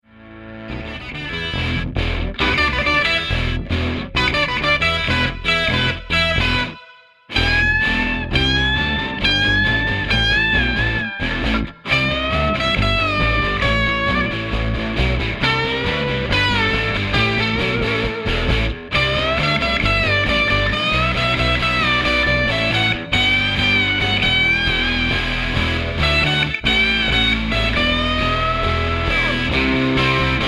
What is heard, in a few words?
Basses Rhythm